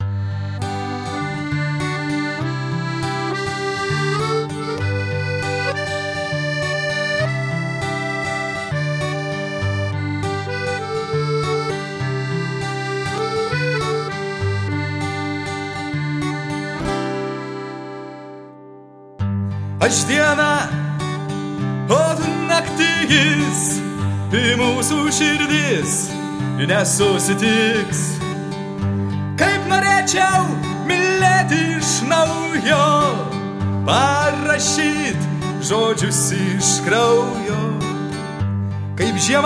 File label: slow